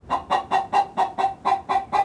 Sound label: chicken; ambient map asia; World of Tanks Blitz